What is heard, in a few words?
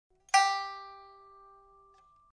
pipa; chuYinKouXian